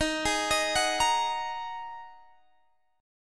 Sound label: A sharp 7th Suspended 4th Sharp 5th D sharp, guitar